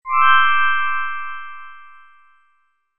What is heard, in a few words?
ALERT